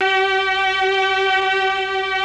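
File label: Mellotron strings G3; Greytsounds VOL-1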